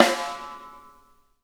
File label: snare; Sonic Reality; Interactive Drum Kits